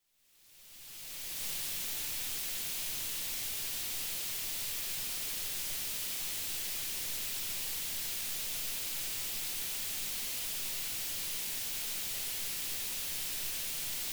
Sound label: pink noise; xlo reference 3; group test loudspeaker cables 2013